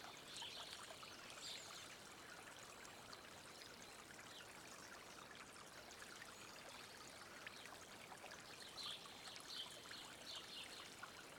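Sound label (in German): Fluss Geräusche; Stardew Valley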